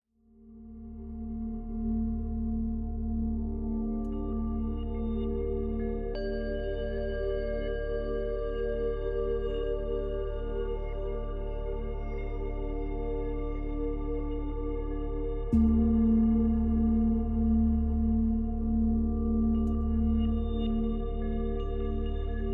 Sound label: Deep Meditation